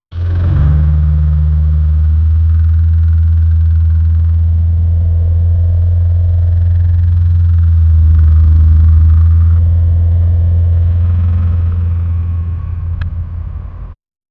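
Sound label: car continuously backfire; automobile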